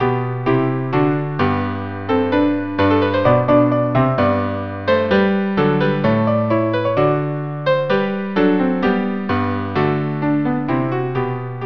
galliarde trio; caroubel

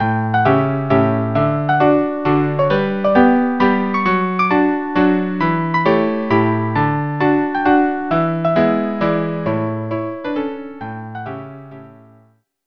trio

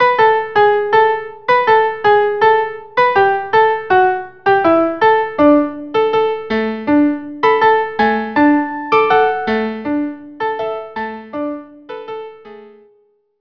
Tango violin duo

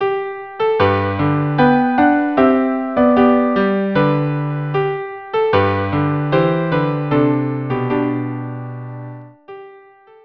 trio; Last Rose of Summer

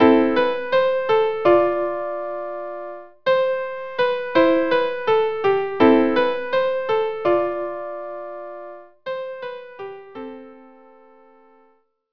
Violin

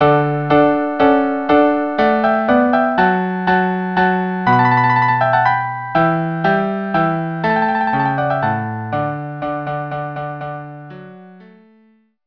minuet trio; Water Music in D

